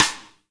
STICK, Drum 1 Dry; East Collexion

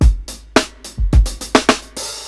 105 8TH FILL, DRUMS; LOOPS; BASEMENT DRUMS VOL 2

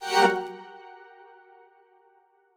Stab; neuro; InsideInfo

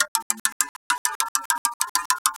Bike Wheel, Acoustic Beats; Sonic Foundry (Sony Creative Software); Myths of Technology

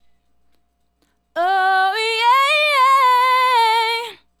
Female Solo, Hip-Hop and RnB Vocals

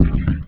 Criket Sft, Cricket

